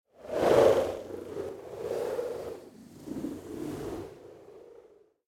Wind Gust Fast Cold - Short And Thin Blizzard Whoosh; Hollywood Edge; sound designer's toolkit v2